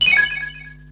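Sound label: Se System Memo touch; Etrian Odyssey I HD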